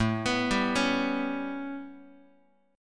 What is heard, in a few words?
A 9th A, Guitar; arpeggiated